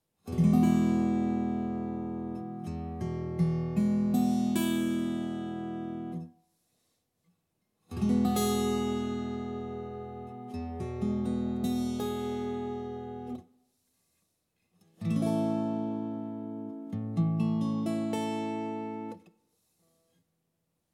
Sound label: Open Tunings For Blues Guitar